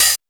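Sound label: hi hat hop, Big Beat Drumloops, speed 140; USB Soundscan vol.25; AKAI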